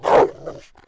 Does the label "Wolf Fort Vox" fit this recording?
Yes